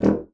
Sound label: D#1, bassoon; Xsample VOL-6; C BASSOON